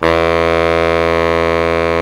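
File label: SAX Baritone Sax E 2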